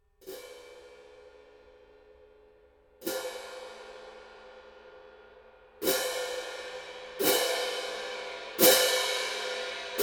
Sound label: 18in a zildjian concert stage